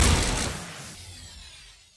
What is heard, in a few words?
camera destroy; star-wars-rebels-team-tactis